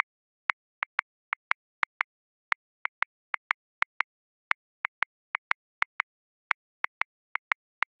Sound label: Swing Jazz, Grooves; Drum Booth; Ableton